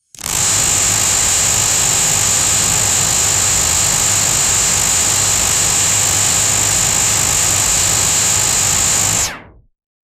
futuristic laser gun firing rapidly

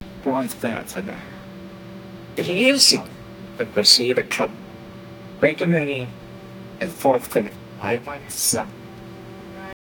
generate a sound of a, vocalizations

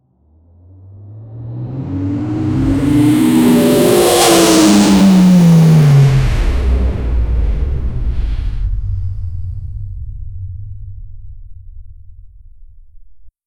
very powerful engine acce, acceleration

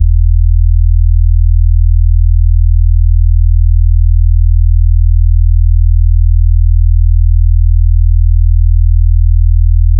atonal low frequency deep, subsonic

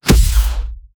impact

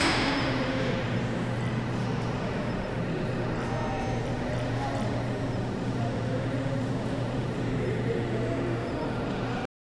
arcade

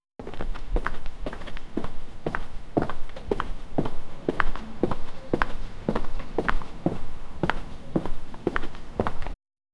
walking slow, footstep

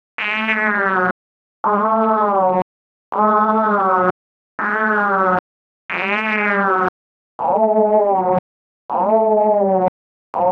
the run of the digital; digital effects

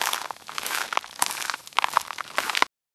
climbing a rocky hill

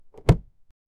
chest closing soft thud; latch

